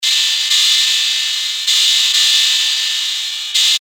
128 BPM

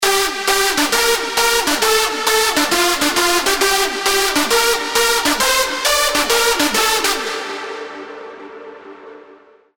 Big Room Leads G WET (134 BPM)

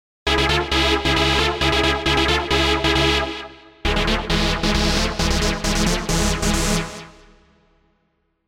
SY Stab; Insane

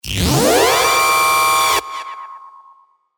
Hazard; SERUM FX

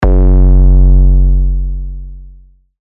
Eight Zero Eight

